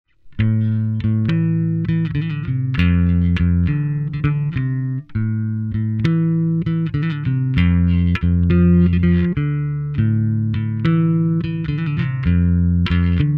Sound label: clean